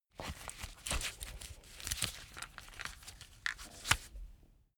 leather belt close heavy

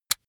razor rickety fold out

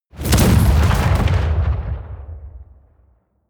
detonation

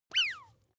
bath duck release soft

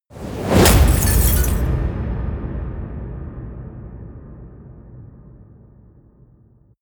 shattered mirror

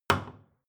wood wood staff indoors

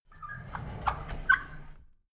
drawer big squeak open soft

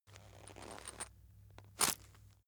chain mail movement

